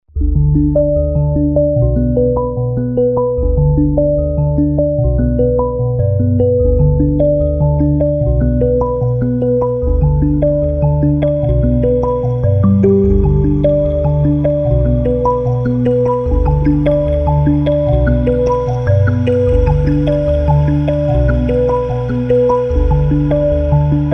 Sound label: mBira Arp; KONTAKT